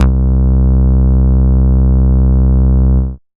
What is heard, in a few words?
Moog Bass, Dance Synths